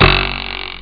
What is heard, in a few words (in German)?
trampolin; ST-XX Amiga Soundtracker Sample Packs